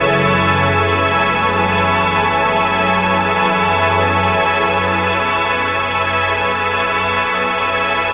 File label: Inner Pad, Jungle; M8